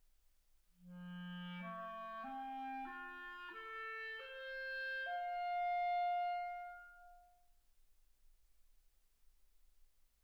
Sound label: clarinet